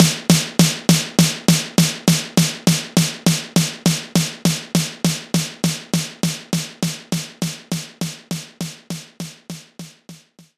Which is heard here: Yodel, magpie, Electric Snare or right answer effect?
Electric Snare